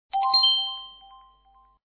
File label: Shine